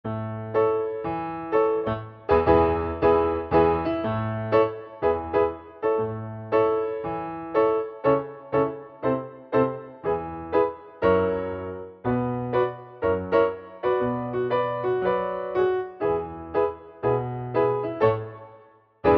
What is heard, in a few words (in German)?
Piano A-Dur